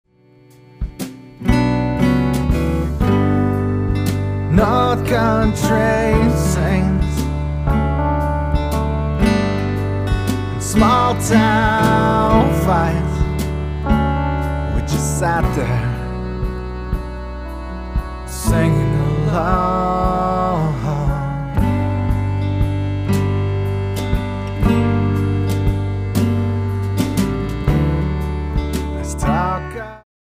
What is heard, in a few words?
country bar